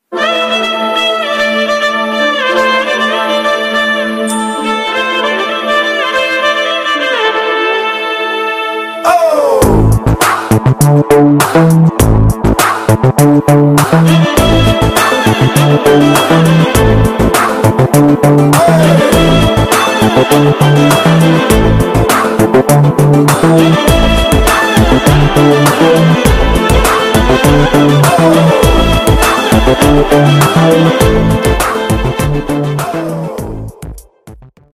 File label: ringtone song; vakeel saab; pspk